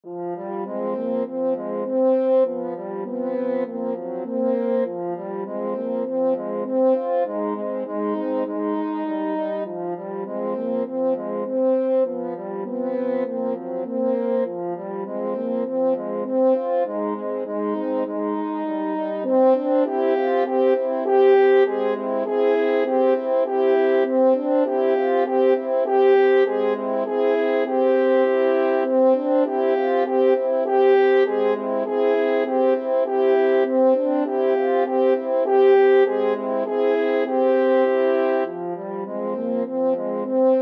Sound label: mountain king, french horn trio